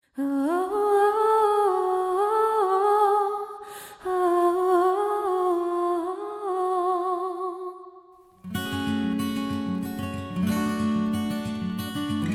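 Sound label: Instrumental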